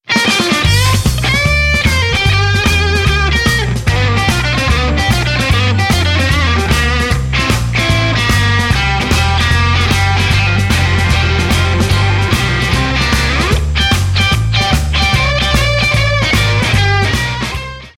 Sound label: blues